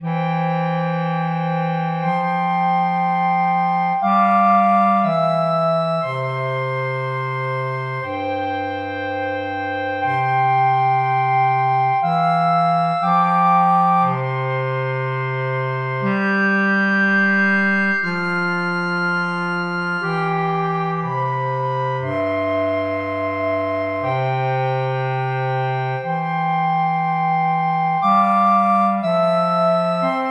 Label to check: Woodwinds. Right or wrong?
Right